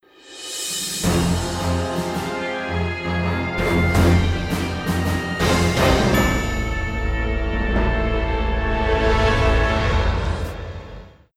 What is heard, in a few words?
Battle Stinger Start; Zak Storm Super Pirate; mobile